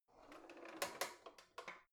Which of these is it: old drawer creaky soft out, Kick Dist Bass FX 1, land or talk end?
old drawer creaky soft out